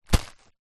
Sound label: first aid kit pouch fabric grab pick up; household